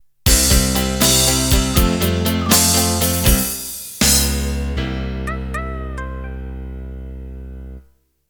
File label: 12-8 Ballad End